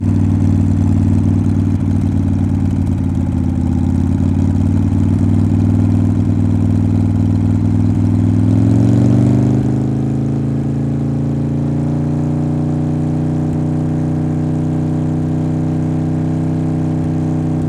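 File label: zvuki motocikla, motorcycle sounds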